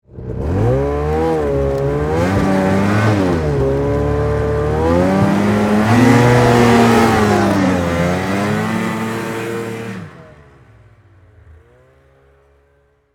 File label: Snowmobile Stuck, Snowmobile, Stuck, In, Snow, Pull, Away, Yamaha, Exciter, 440; Sports; BlastWave